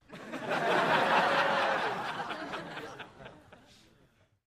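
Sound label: Crowd 100 Medium Burst Interior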